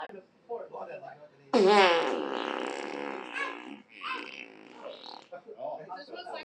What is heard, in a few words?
dry fart